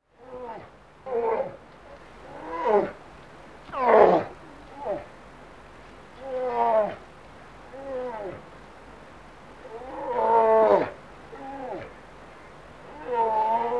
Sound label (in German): Braunbaer Rufe; Tembrock Guenter